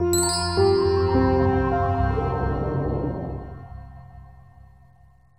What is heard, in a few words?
Checkpoint Activate